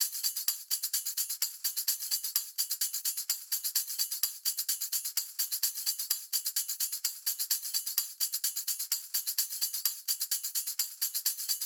Tamb; Drumloops; Multi Loops 128BPM; Drum Loop 23 128BPM Loop Elements; VEC5

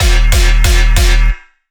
gun, bass

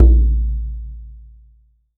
FRAME DRU, FRAME DRUMS; Voice Crystal - Steve Reid Definitive Percussion

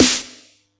Yamaha EX-5

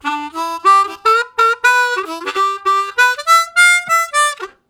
Go More, harmonica riffs; Zero G - Harmonica

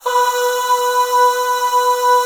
B3 MALE AH; Northstar - New Gold VOL-2

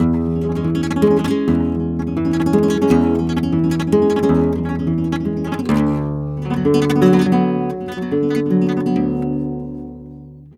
Flamenco, Guitar, Tremolos, e

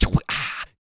PERC, MALE PERC; Spectrasonics Vocal Planet; Jazz FX

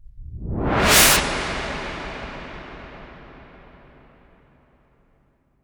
IMP WIPE, Cinematic Impact

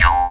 jaw, Didgeridoo and Other Primitive Instruments

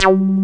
HOUSE, KORG, Analog; USB Soundscan vol.49; AKAI

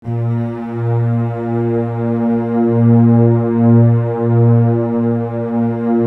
A#1, Keypad; Standard Instr; Keypad 3

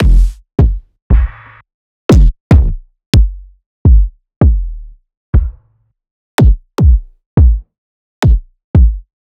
One Shots Kick

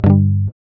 G, Acoustic; Sound & Vision; Gigapack I CD 2; GUI ACOUST.; GUI Acoustic 1

